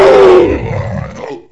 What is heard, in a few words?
death; QUAKE2; cyborg